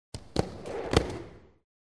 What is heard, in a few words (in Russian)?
dva zvuka v volejbole